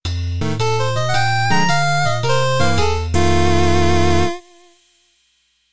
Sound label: Midnight City Blues, Jingle